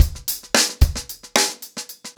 Hip Hop 110BPM, acoustic drums drumloops

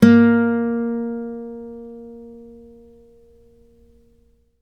ACC FG A#2, ACOUSTIC, Guitar; East West; Steve Stevens Guitar Samples Collection

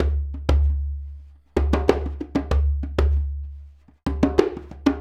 Djembe

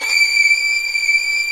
STR SW VLN, Vlns 8 fff; String Master Series

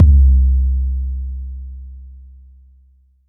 Jungle Kick, Roland SH-32 Drumset

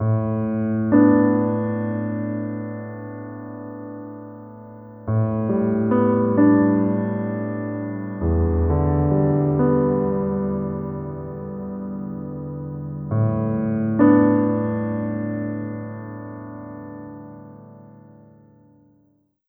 Piano

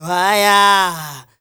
Zulu male, Heart of Africa, male dry; Spectrasonics; Heart of Africa 2; S.MALEDRY

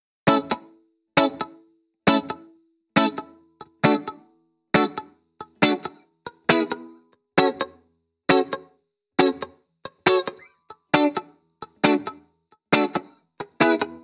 Guitar Bpm135 Key A, 70s Guitars; Fox Samples - Back to the 70s Guitars

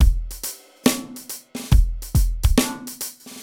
Dub Loop, Drums & Percussion, Electronic; Sonic Foundry (Sony Creative Software) - Electronic Point Blank

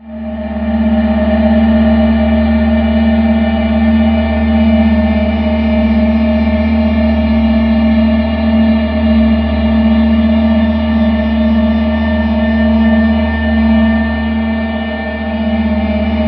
SPACE, Ominous Drones, SYNTH SND

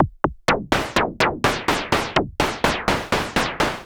SH101 Clocked LFO Rhythm (125BPM)

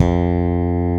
tite bass F3; Drums In Your FACE!

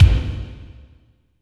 KICK, Tekno Industrial; East West

